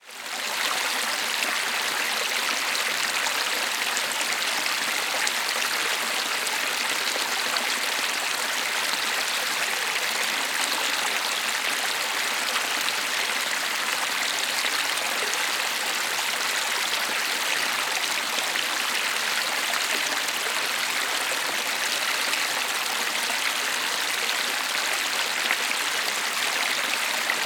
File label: nature creek forest shallow birds buderim forest qld australia